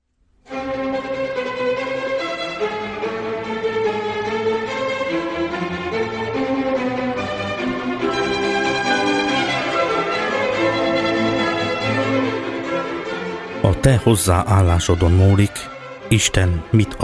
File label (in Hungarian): Pénzkérő Jingle